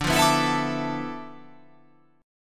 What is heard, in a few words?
D Minor 6th Add 9th D